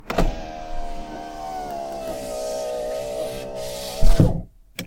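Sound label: close power window; car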